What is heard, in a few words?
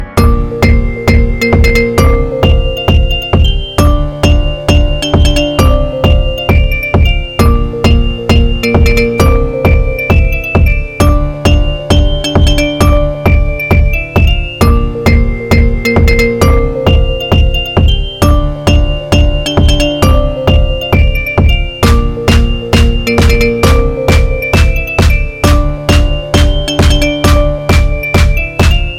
Music Box; meloboom